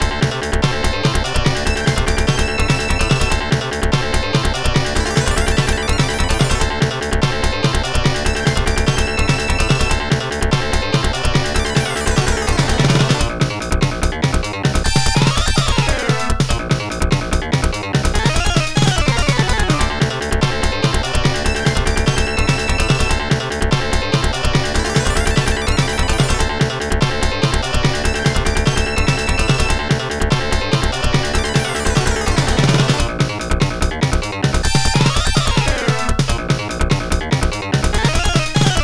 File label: arcade; alien storm